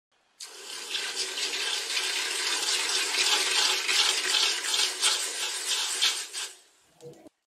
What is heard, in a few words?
Hot iron in water; آهن داغ در آب